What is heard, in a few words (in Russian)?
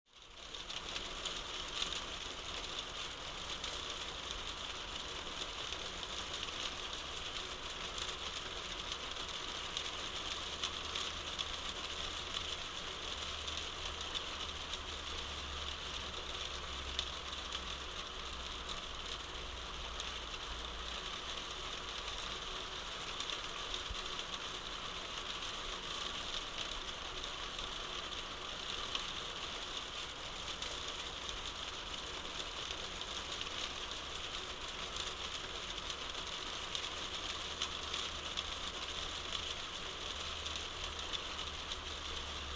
zvuk dozhdja v interere